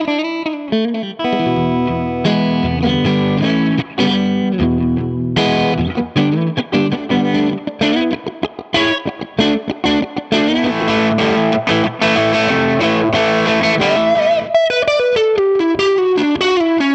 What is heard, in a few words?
JMP STRAT